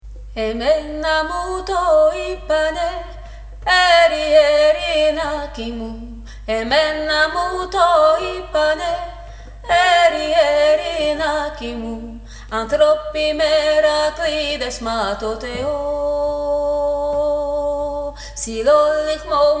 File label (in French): voix medium, chant; Trad. Grec; Danse Kalamatianos